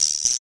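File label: guiro, Roland CR-78 drum machine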